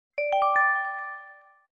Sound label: bright; huawei harmonyos